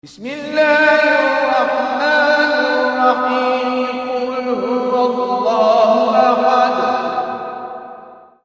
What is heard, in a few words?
male prayer